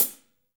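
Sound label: hi-hat; Best Service Gigapack 2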